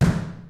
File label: kicks snare, kid; Kid Nepro; Elite; KICKS SNARES